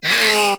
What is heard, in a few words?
Kavat Vocal Pain; Warframe; Khora